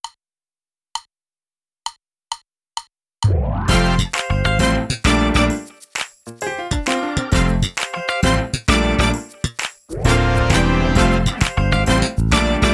Uplifting drumless

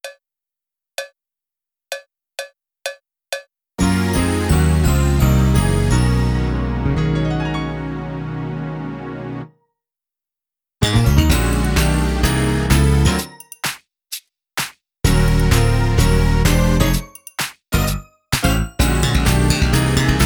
A Dirty Loop drumless

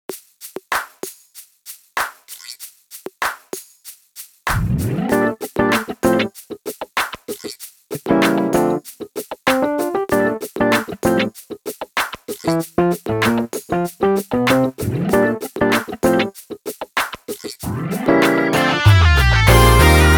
Groove drumless

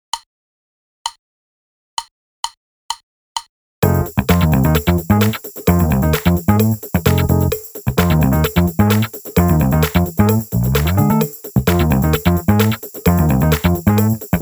Speed Funk drumless

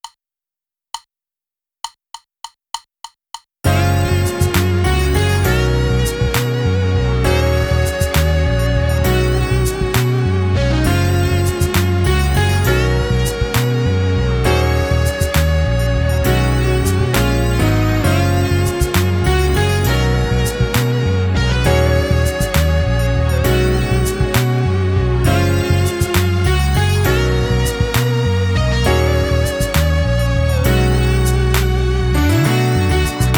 Sunrise Fusion drumless

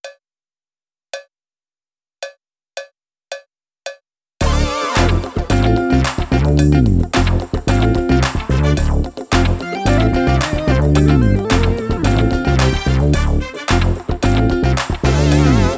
Retro Funky drumless

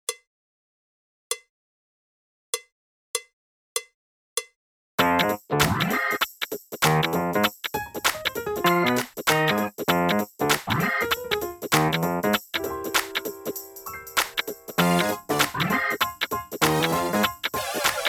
Funk Rock Gospel Jazz Fusion Jam drumless

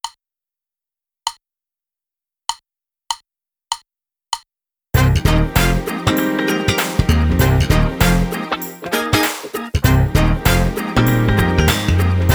Groove drumless

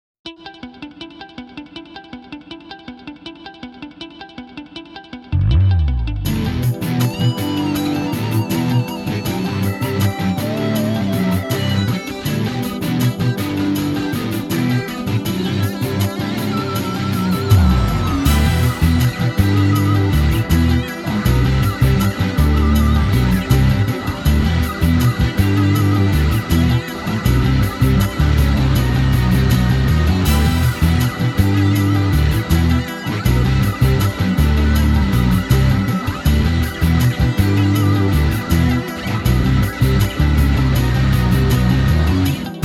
Rock drumless

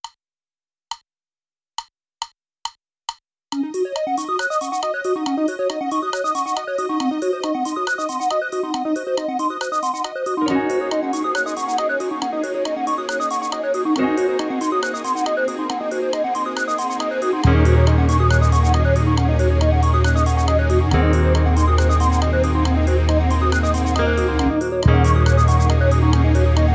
Funny Fusion drumless

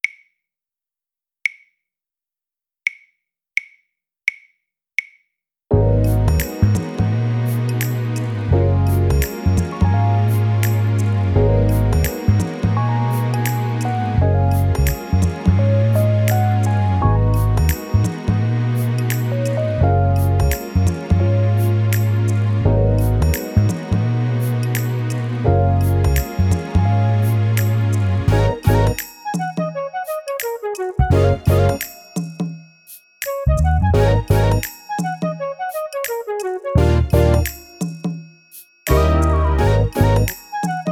Smooth Jam drumless

